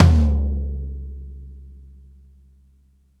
ROOMY FT LOUD, TOMS; BASEMENT DRUMS VOL 2

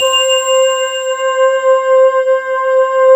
D50 FANTASIA, ROLAND D-50; Rubber Chicken Software Sample Bank

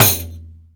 RIQ, ETHNIC PERC; Instruments & Percussions; InVision Interactive; Lightware VOL-1

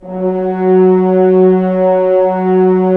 Horns; Roland - LA Composer vol. 4

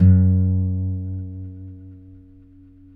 Finger F#2 R, Guitars; Spectrasonics; Hans Zimmer Guitars VOL-1

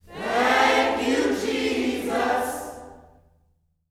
THANK U JC, Gospel

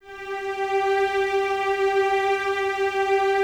CES G 4, Cellos, String Ensembles; Miroslav Vitous; 90 s Sample CDs

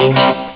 far guitar; Amiga Soundtracker sample

